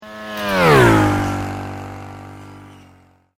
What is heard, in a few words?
Sweep Mechanical Slow